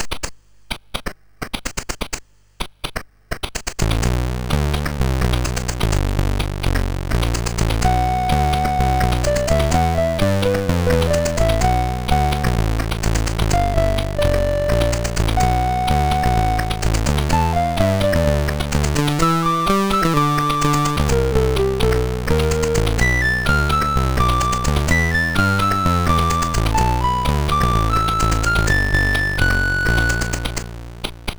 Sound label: MOS6581R3, sid; Broker